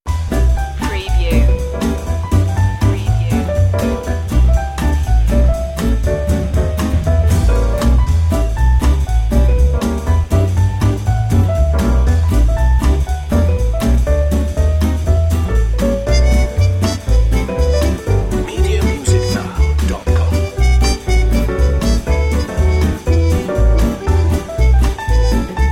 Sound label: here comes that jazz